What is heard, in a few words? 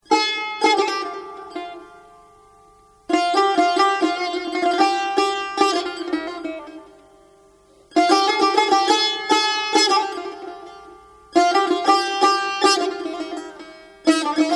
tar; Saz; Sonati